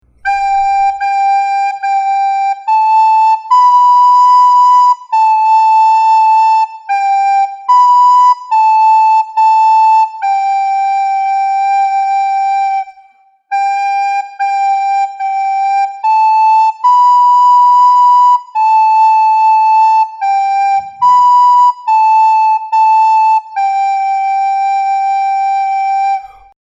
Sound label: Recorder